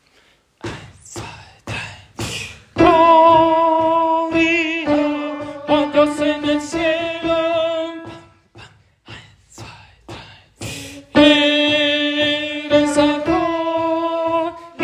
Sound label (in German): Gloria Tenor